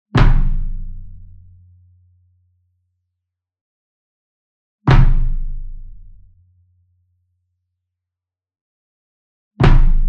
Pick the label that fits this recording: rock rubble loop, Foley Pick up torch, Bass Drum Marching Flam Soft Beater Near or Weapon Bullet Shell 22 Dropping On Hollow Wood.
Bass Drum Marching Flam Soft Beater Near